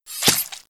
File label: scream kill